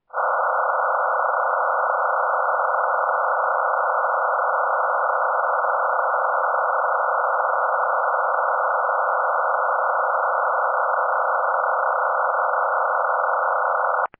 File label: MT63 sample